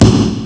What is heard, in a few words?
B drum; ST-XX Amiga Soundtracker Sample Packs